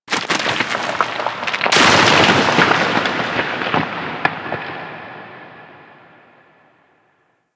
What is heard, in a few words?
Rock Fall Large; Ambience; Warframe; Corpus Outpost